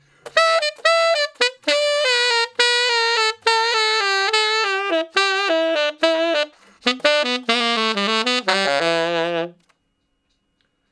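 Sax Slow Blues (E I, IV, I) pickup 1 beat, Tenor Sax; Ten Sax Slo Blus (E); R&B; Solos; Crimson Blue and Fabulous Horncraft 4 RnB; Horncraft for R&B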